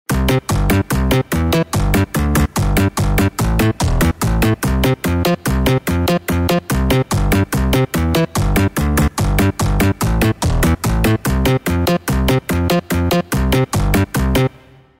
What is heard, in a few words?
Bass EDM Electro